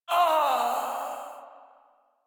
Death, Barks, Battle; Warframe; Baro Defense

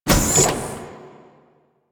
Door Small Open; Ambience; Warframe; The New War; Quests; Zariman

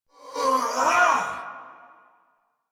Attack Effort; Warframe; Dax Ashigaru; en

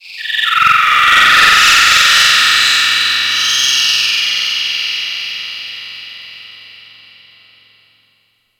Tech Texture Small, Ambience; Cephalon

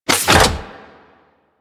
Small Door Close; Crp Gas City; Corpus

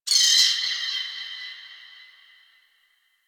Forest Bird High; Warframe; Grineer Forest

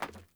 Sandals Run Metal, Footsteps

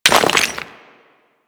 Melee Impact Cement; Warframe; Weapons

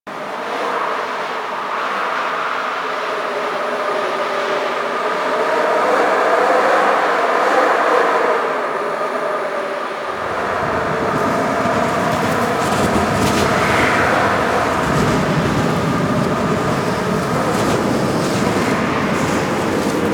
Cold Wind Amb; Warframe; Ambience; Corpus; Crp Exterior

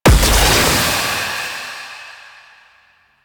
Smoke Screen Explosion; Warframe; Stalker

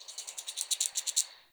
Forest Open Glade Chipmunk, Day; Ambience

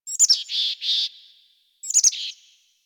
Zone Tree Critters, Ambience Plains; Warframe; Eidolon Plains; One Offs

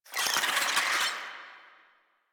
Infested Ashen Vocal Attack; Warframe

